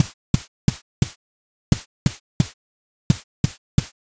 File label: footsteps gravel